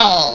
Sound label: Squish Player; Greebles 1.0